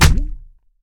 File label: hit trunk; smite; god ganesha skin11